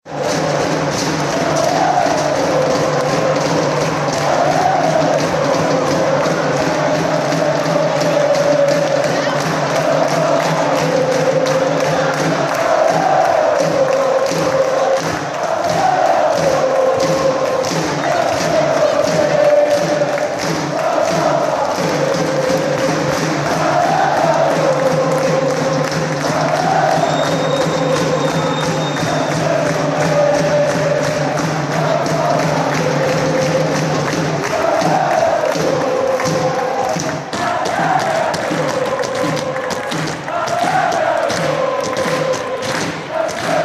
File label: allez allez allez ohhh, chants